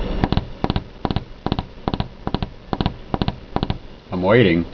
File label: tap tap